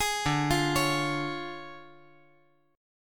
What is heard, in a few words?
D Minor Major 7th Flat 5th D